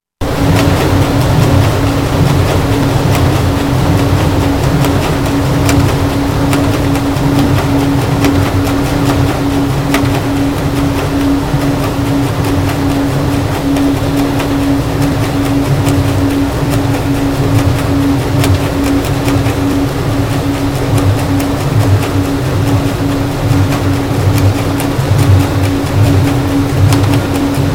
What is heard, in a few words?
Ship sound; shipping noise; Conservation group calls for more research into effects of mine shipping noise on narwhal